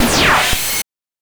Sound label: BANK2 SFX, NES DPCM; MIDINES